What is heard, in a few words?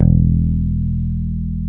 E.BASS